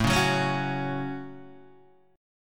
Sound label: A 6th, Guitar, DADGAD